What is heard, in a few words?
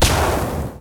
hit fire; smite; god merlin